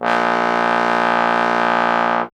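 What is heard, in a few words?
bass trombone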